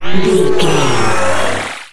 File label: sci-fi power up